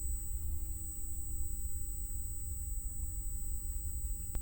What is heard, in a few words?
Hydrophone